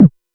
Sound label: Bass bd, Jomox Airbase99